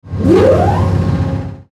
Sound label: Electrical Machine Power Up Hum; Production Element Imaging Element Accent Transition